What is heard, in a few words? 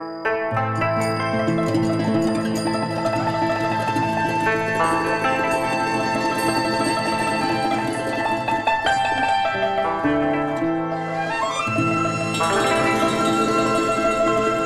TRADITIONAL Chinese, Picking Flowers